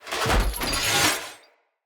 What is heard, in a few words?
Sword Heavy Attack Charge; Weapons; Enemy; Swords; Mnd King Swords